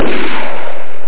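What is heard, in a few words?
gun shot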